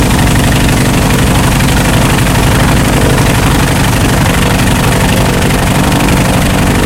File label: wildcat side, engines; War Thunder